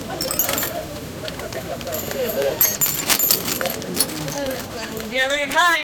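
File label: lively bustling atmosphere, outdoor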